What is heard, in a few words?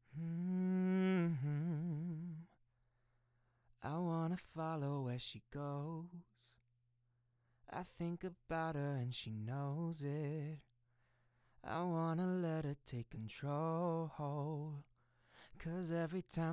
Singing Sample